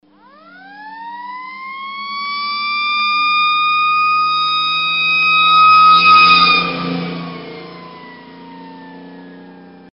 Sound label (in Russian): zvuki pozharnoj mashiny